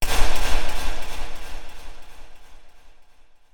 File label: Epic Echo